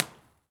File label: Brewery, Impulse Response; Pabst; EchoThief Impulse Response Library